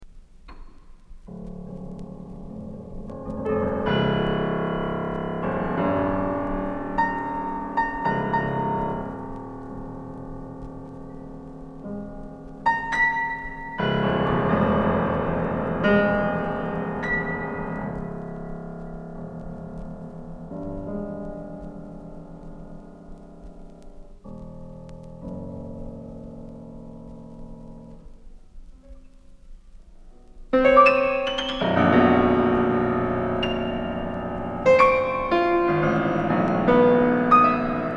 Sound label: piano variations